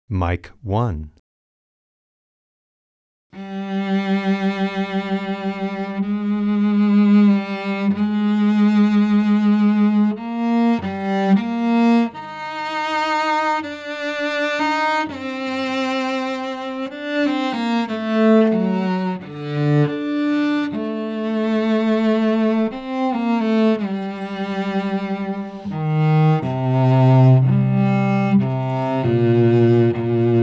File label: Cello